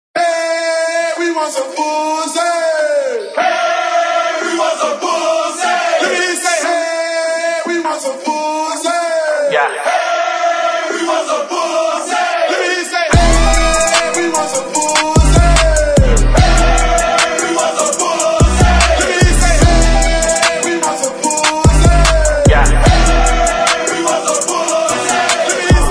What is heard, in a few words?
Bootleg Acap In